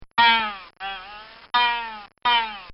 Twangs And Bongs, car

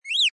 Cartoon Squeak, Little bird tweet; Comedy/Cartoon, Squeak 01

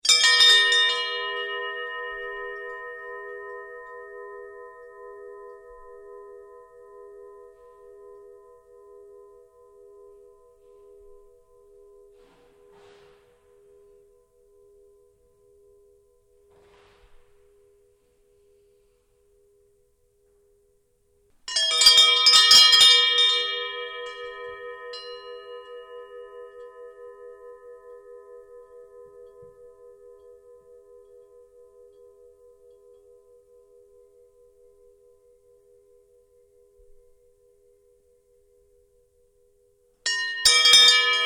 Hand bell temple bell; Tom Hutchings

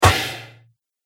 Mace Dents Plate Mail; Impact Sound Effects; combat

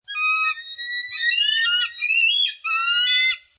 One Eagle And One Vulture Squawking Excitedly; Birds of prey: One eagle and one vulture squawking excitedly

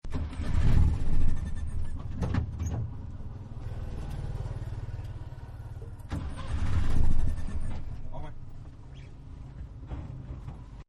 Tuk Tuk False Start; Carriages; Vehicles; Partners In Rhyme

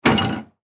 Ship Door Close Hard; Ship Door Close Hard Rattle; Transportation Watercraft

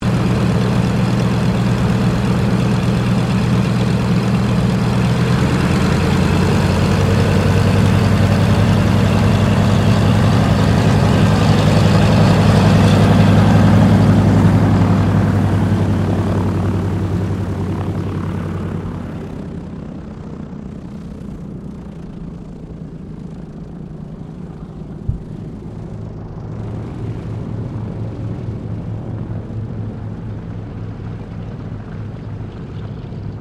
AIRCRAFT DOUGLAS DC3 TAXI; Qantas 1942 DC3; Airplane; Airplanes; Vehicles

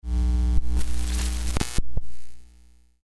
Electrical Glitch; Technology; Noise; Electronics; Blastwave

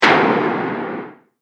Heavyweight Explosion; Bomb Sound Effects; Impact / Explosion Sound Effect

